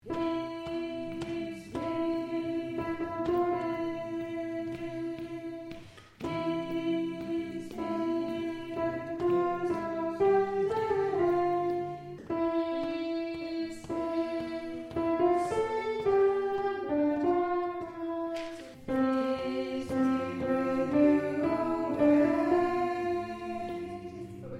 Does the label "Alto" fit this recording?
Yes